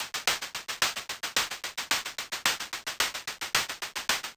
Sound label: Scroll Kit C, electronica